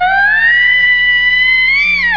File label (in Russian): gromkij protyazhnij krik kasatki